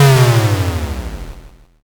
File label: explosion l